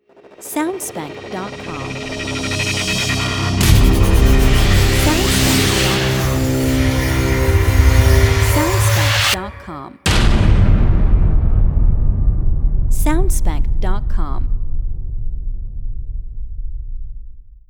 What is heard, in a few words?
Hybrid Transformation Logo